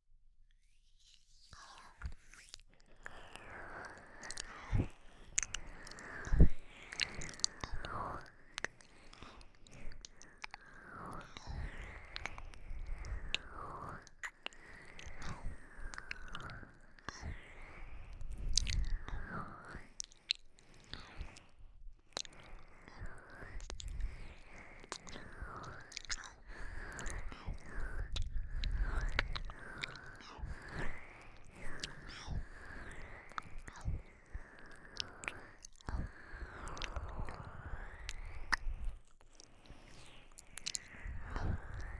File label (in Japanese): あむあむ 右 マッサージ 左